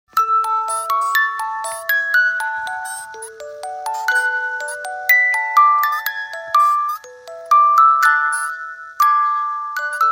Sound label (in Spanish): Himno Barcelona